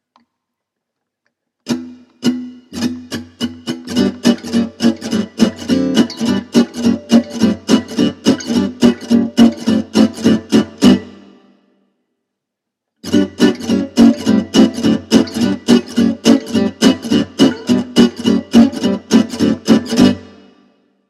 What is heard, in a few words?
fast micro backing